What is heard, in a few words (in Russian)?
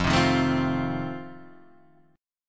akkord f7 boj